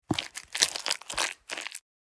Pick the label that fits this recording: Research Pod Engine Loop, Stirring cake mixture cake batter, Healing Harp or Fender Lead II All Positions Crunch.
Stirring cake mixture cake batter